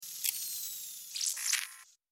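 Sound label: night vision off; STALZONE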